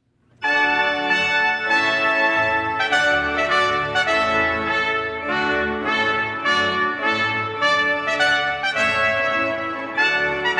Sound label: Trumpet Tune; Purcell